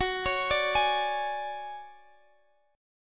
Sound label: D Major 7th Flat 5th